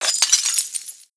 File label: debris glass, bullet impact debris